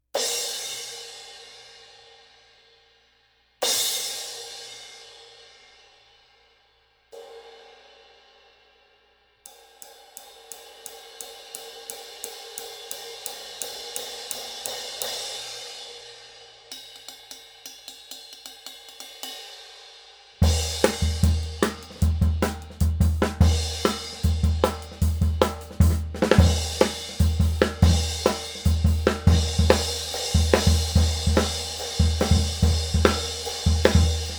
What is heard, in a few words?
Paiste 14